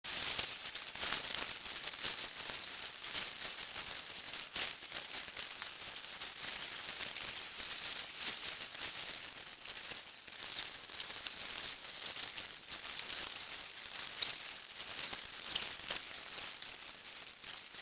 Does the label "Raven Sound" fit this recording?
No